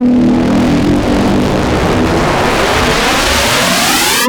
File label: FUZZ SPLIT; ILIO